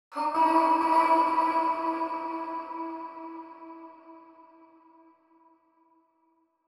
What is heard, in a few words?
130bpm Am, Vocalpack; Vocals